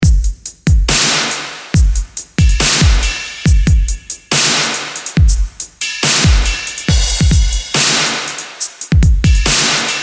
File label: Bpm140 StepStop